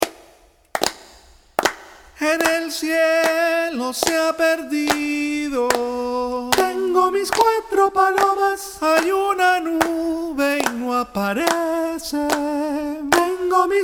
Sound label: cuatro palomas soprano